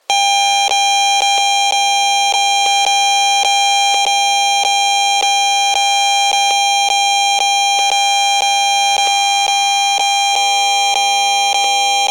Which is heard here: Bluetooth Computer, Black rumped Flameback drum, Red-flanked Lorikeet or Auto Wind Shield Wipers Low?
Bluetooth Computer